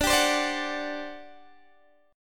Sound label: G Major 7th Suspended 4th, D